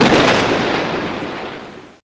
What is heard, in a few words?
thunder